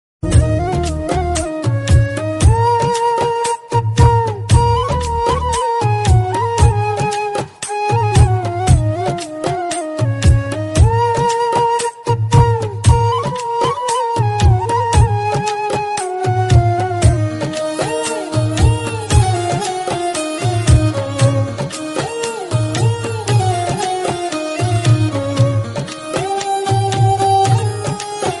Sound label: Flute BGM